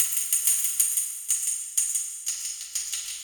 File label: tamb